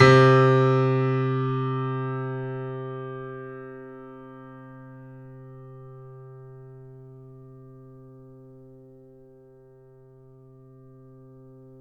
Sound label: FF C2, Grand Piano; AKAI S6000 CD-ROM - Volume 2; Grand Piano 256M